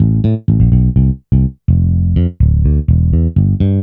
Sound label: BSE 125 C, BASS LOOPS, House; Best Service ProSamples vol.10 - House; AKAI